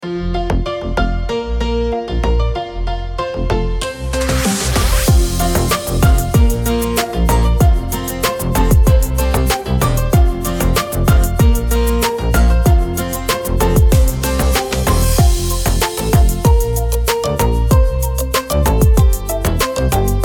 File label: background tropical house funny music for video vlog